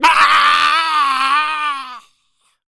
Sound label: Male Berserk, Zombies; zps; Male1